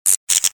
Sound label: Electricity